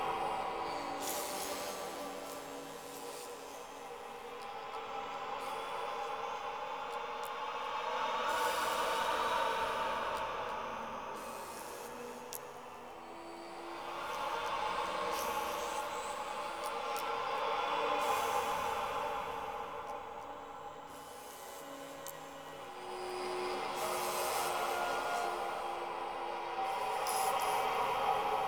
Ext Four Bed A, exterior ambience; Warframe; Sentient Devourer

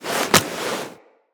Item Pickup Foley; Characters; Tenno

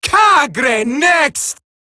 attack, female; Warframe; Eximus Grineer Female; Battle Barks

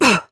Pain, Adult Operator; New War

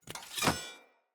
Cleansed Spear Equip; Weapons; Player

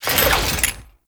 Body Roll, Foley; Warframe; Duviri; Dax

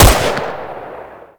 Rifle Shot; Weapons; Warframe; Corpus; Ruban

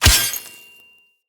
Melee Sword Impact Robotic Flesh

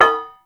Glass Tap; Ambience; Props; Void Kid; Warframe; The New War; Zariman

